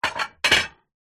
household stack of plates dishes set down on wood surface